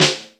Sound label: Snare mid